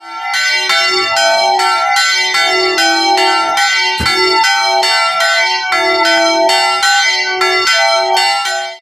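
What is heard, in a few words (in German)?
Tonaufnahme e2 fis2, mehrstimmige Geläute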